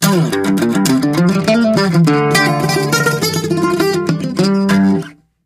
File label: stinger solo; sea of stars ost